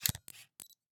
Camera, Pentax KS2, DSLR, electronic, mechanical, ready shot, flash up, focus, quick; Eiravaein Works; Vaeyan IV